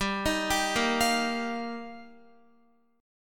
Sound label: G Minor 7th Sharp 5th, Guitar; Gm7#5; arpeggiated; x,10,13,12,10,12